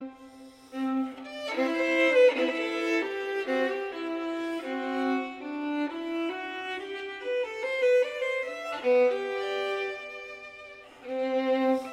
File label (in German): Andante aus der Solosonate; J S Bach